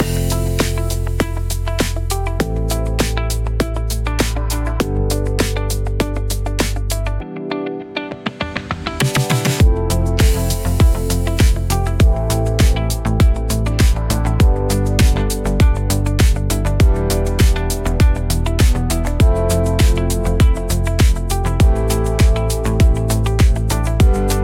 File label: Pop